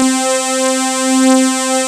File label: MG SYNTHS; Pro-Rec - Synthsonic; JV ANALOG